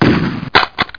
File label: shoot; gamesencyclopedia1995